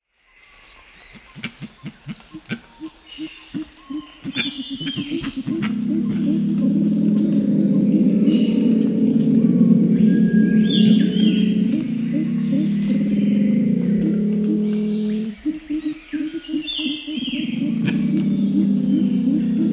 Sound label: pale-winged trumpeter psophia leucoptera